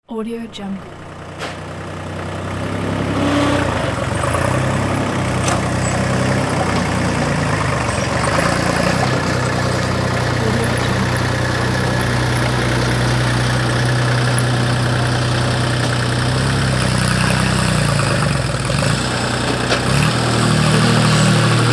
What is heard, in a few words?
tractor